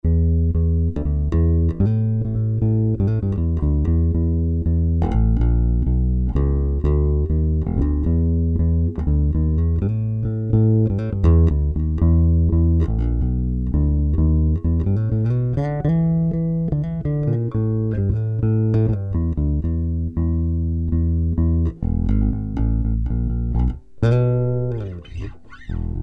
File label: Peavey T-40 bypass, Boss ME-50B; Preset Patch